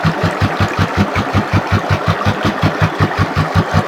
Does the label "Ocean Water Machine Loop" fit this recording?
Yes